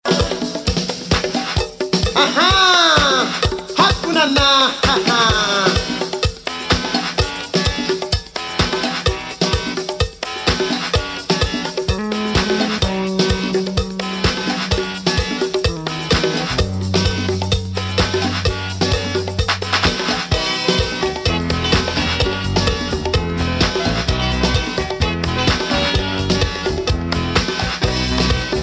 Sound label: samba samba